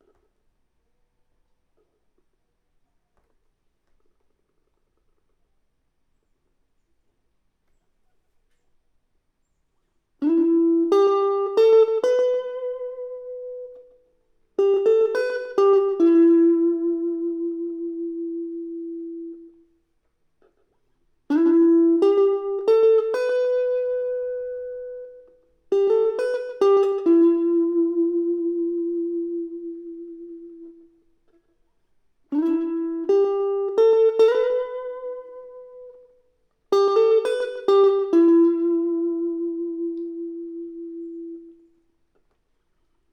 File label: Elect Guitar